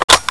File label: 5.56 mm pickup